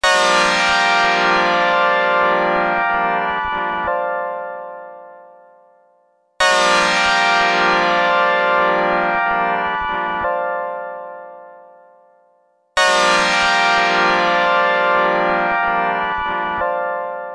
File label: Beam (Ringtone); Codebase